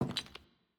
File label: Footstep Plates